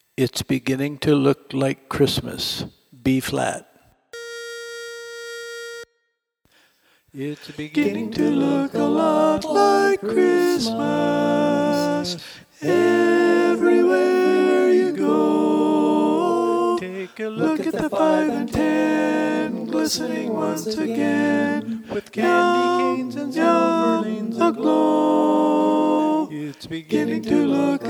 Tenor

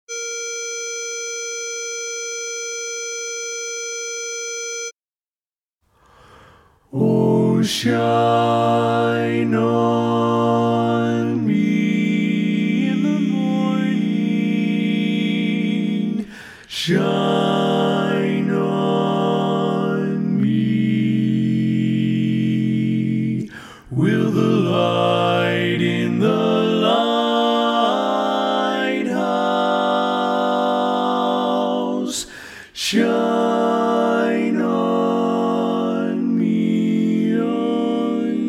Bari, Barberpole Cat; Learning Tracks

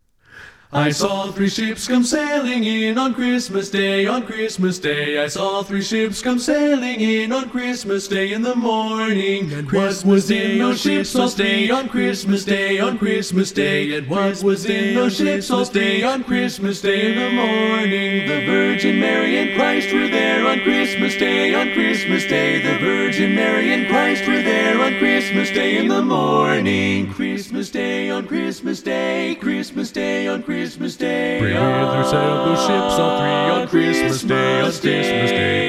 All, Learning Tracks; I Saw Three Ships

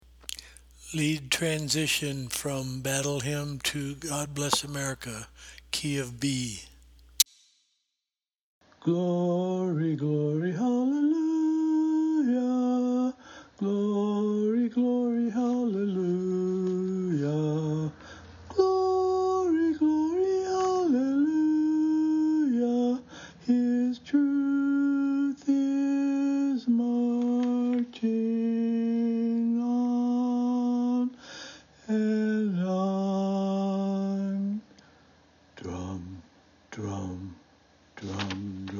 Battle Hymn God Bless Lead

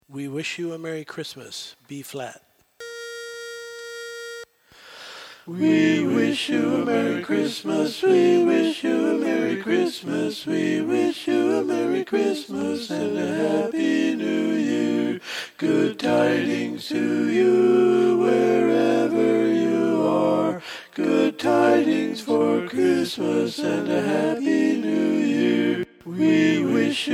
Lead, Learning Tracks; We Wish You a Merry Christmas

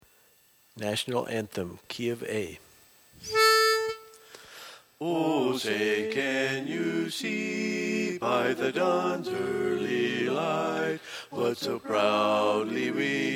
Bass, Learning Tracks; Star Spangled Banner

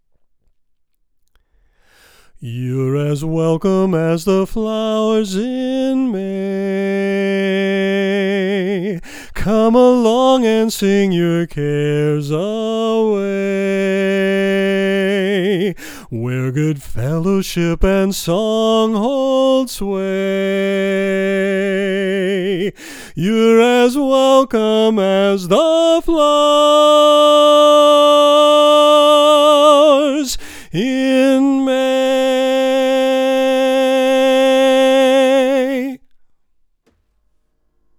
Lead Flowers In May

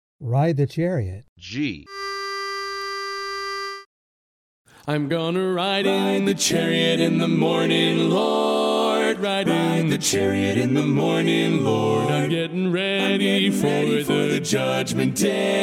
Bass, Learning Tracks

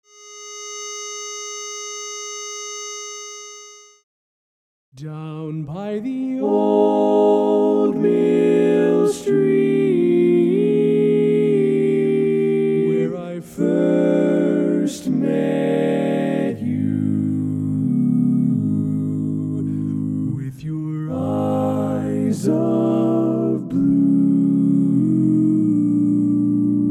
Tenor, Learning Tracks, Barberpole Cat

